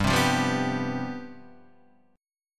C Major 7th Suspended 4th, G, guitar chord

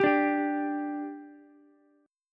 G 5th G Ukulele, chord; G5 strummed

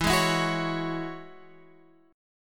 E 6th Add 9th, Guitar, Drop D; chord shape

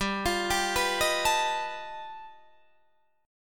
G Augmented 9th, Guitar, Open D

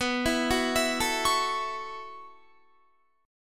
B 9th Suspended 4th B down Guitar; arpeggiated

